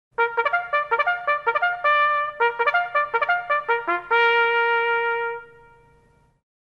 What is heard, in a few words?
officers call, bugle